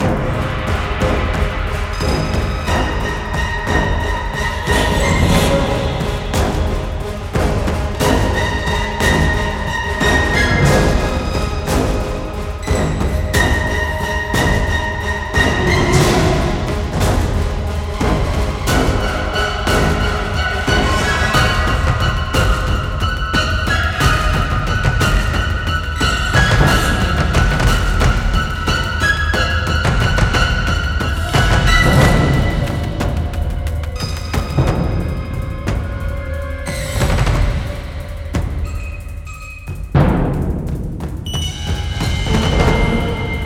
Action FULL Loop 180BPM, Classic Horror Sci-Fi Music; Dead Ark